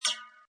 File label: Metallic, Button sound or menu sound; Multimedia; Button Sound Effects